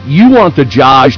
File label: pain, agrunt